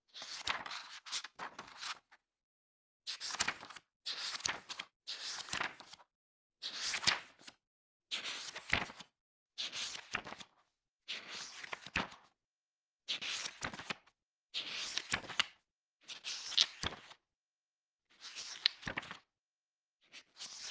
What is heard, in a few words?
regular book flip through